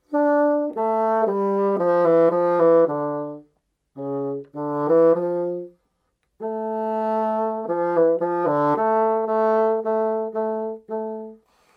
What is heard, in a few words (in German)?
Fagott